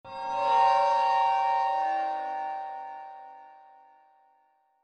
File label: horror